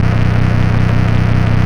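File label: RUMBLE right, Hard & Loud Techno; USB Soundscan vol.01; AKAI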